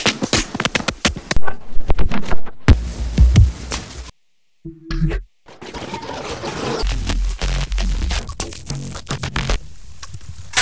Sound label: Dance Diffusion